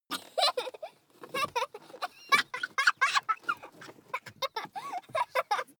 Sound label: child laugh tickle